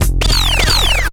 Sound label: Spinback; Zero-G Jungle Warfare 1, 2 & 3; 90 s Sample CDs